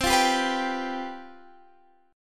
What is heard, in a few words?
C Major 7th Suspended 4th, down, Guitar, Half Step Down